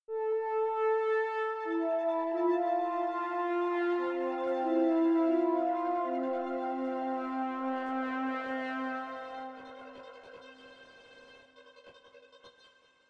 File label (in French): grainspace synthe